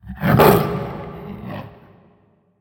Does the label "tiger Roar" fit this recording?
Yes